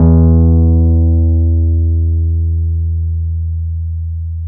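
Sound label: Wurly E 3, piano; Keyboards of The 60's and 70's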